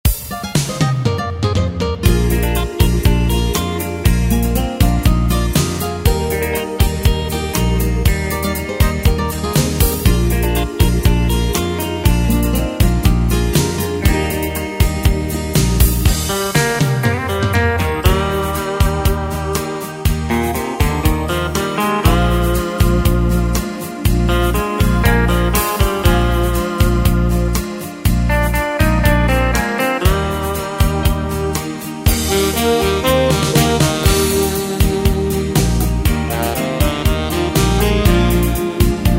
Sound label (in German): 70s Schlager Gold